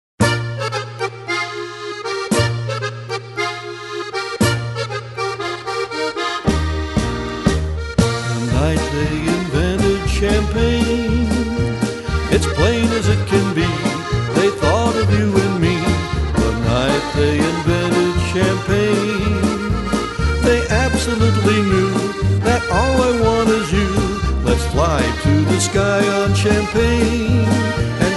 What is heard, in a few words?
Polka, Button Box